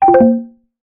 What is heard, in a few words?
Ingenious, notifications; Realme 8